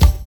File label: CONGA LOOP; Univers Sons